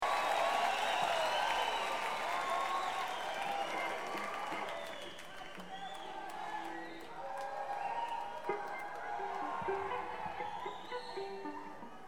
Crowd; Spokane, WA; Opera House

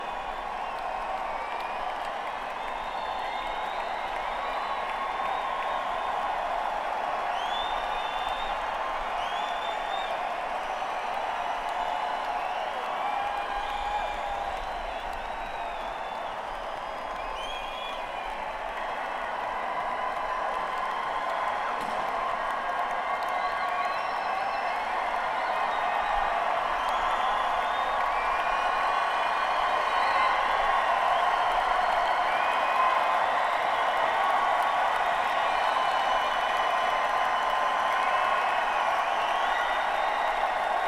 Crowd, Madison Square Garden; New York, NY

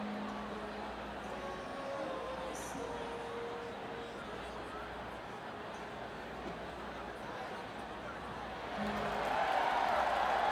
Crowd, Saratoga Performing Arts Center

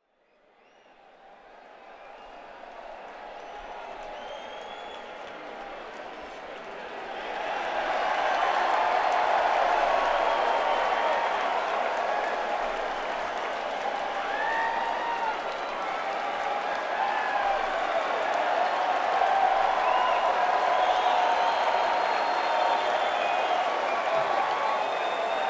Crowd, Saratoga Performing Arts Center; Saratoga Springs, NY

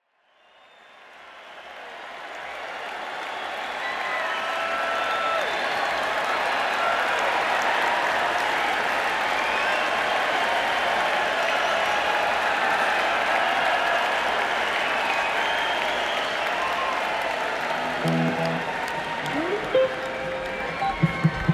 Crowd; Mansfield, MA - Xfinity Center

